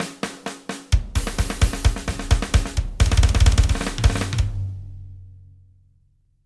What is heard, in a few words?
EzDrummer